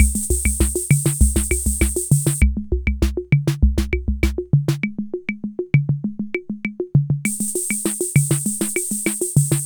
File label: R77 Rhumba & Rock; rhythm77